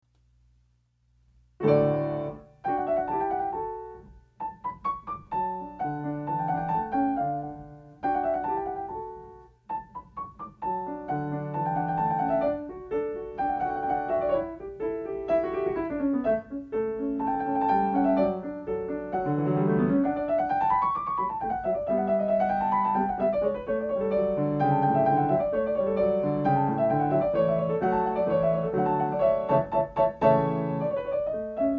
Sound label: piano Mozart Sonata in D